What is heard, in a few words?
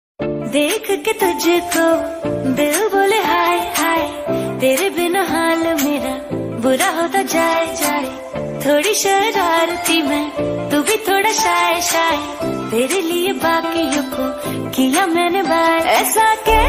song ringtone female version ringtone love ringtone Hindi ringtone